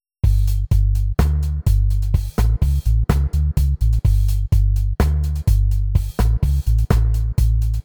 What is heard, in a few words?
beat, reggae loops